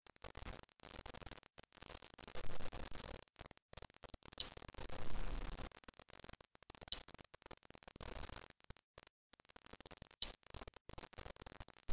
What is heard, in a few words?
piezo